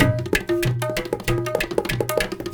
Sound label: INDIA L, IND ENS PH, Heart of Asia; Spectrasonics - Heart of Asia CD2